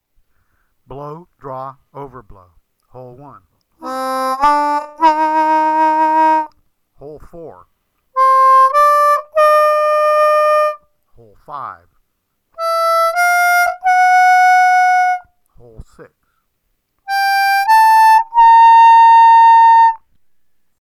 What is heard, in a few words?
Overblows